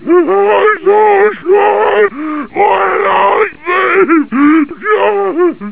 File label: zombie madness